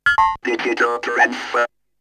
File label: Vocal; ZERO G - Datafile DISC 3